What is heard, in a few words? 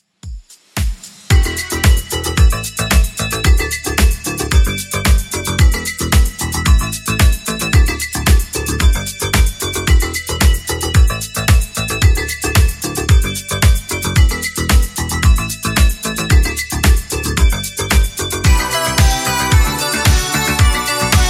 80s Redrum 112bpm